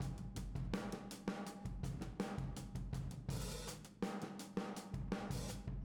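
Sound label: Boogaloo, Room Mono, 82 BPM; Drums; Verse; Sonic Foundry; Drums from the Big Room The Mixes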